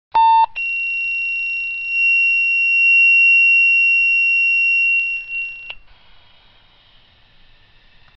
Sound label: Tone F, Watchdog Alert; OSI